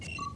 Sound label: Mouse Over